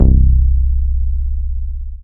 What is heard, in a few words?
Dance Floor Bass, Multisample Basses